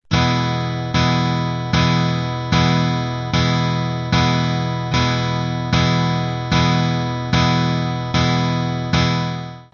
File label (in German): e dur akkord